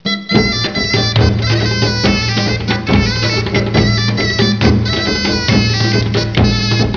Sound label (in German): echte schalmei